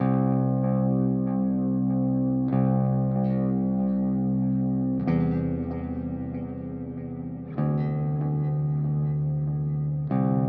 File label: 95bpm emin; Horizon 08; Melody; Loop; Guitar